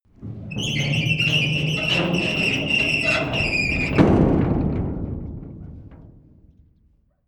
metal gate open long

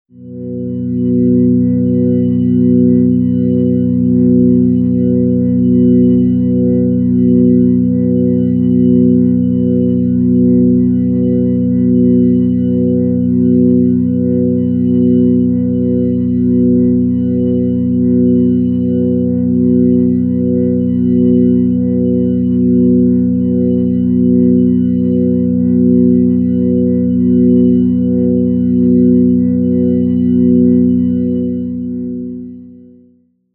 organ chord neutral b

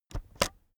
keyboard external space press slow

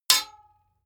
sword metal hit ring

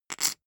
poker chip stack small rattle short wobble

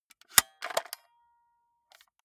walkman close medium

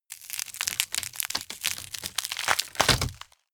tear

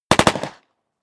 smg uzi 45 medium distant

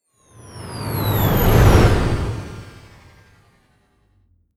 flyby

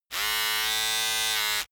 servo

